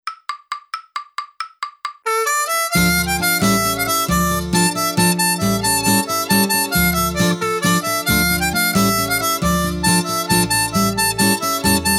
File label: Tarantella Siciliana harp; Seydel